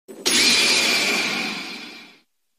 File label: Sharingan The Sound